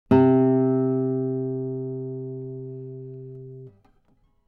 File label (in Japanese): C3 on A 指弾き, 撥弦; 弦鳴楽器; アポヤンド